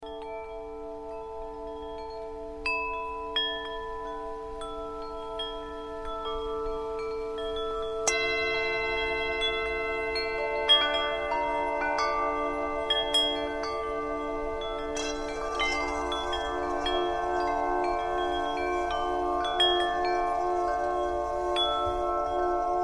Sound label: Wind Chimes Bowl; Locus Voci